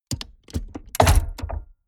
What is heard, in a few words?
mechanics medium snap